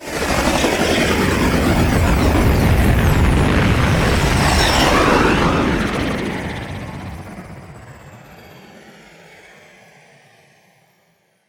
Drop Off, Vehicles, Flight; Blue Sky Liset; Warframe

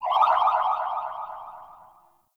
Corpus Ship Tech Chirps; Warframe; Corpus Ship Remaster

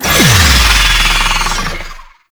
Boss Vocal Strike Leap Telegraph; Enemies; Warframe; Oraxia

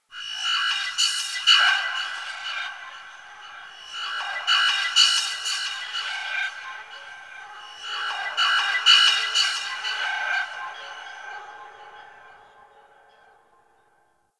Grain Bird; Warframe; Tau

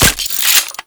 Revolver Rifle Mech, Weapons; Warframe; Tenno; Mod Quest

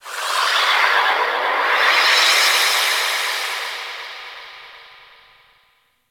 wind; Moon Wind Small; Ambience; Warframe; Orokin Moon

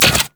morph cycle; Warframe; Khora

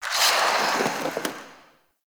Dash Stinger; Warframe; Dante

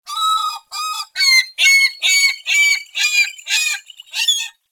Beach Seagull; Warframe; Grineer Beach

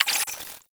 Calendar Date Shift End; Warframe; NineteenNinetyNine; CharacterIntro

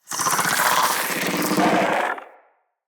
Root Spider Elite Vocal Chatter; Animals; Spiders; Vocals

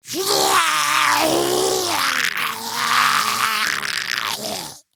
Arm Pain Grineer Ghoul; Grineer Ghoul Expired; Battle Barks

